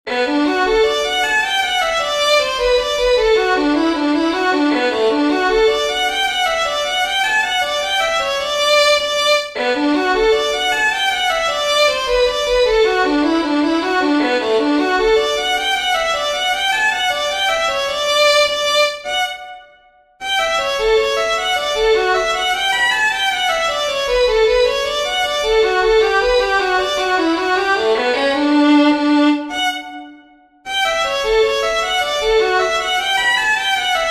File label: PEBBLE AND GOOSES JIG, fiddlers; southern kings fiddlers